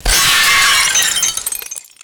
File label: medium glass window smashing and shattering